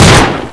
rc28 fire

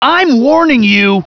hurt soldier

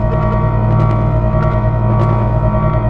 noise, ambience

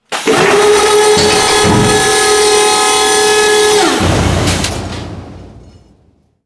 arm close

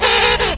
hunt, penguin

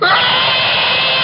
die; bullchicken

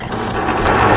minigun spinup; scmod; ut99

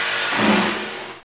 platform stopped; pfhor

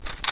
door latch; ambience

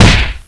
baseball bat strike

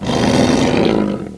bear pain

